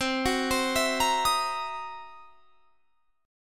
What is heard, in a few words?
C 7th Sharp 9th Flat 5th, C, down; arpeggiated; chord; JGuitar